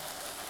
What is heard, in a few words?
push; Dino and Aliens